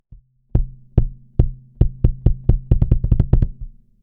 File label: Kick; VLA-2A Mark II